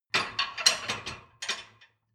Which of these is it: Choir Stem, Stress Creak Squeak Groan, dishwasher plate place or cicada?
dishwasher plate place